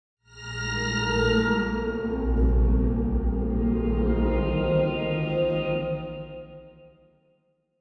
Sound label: Musical Feedback Front To Rear; Blastwave